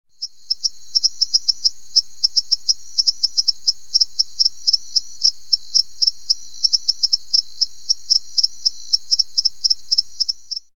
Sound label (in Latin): Hyla riveroi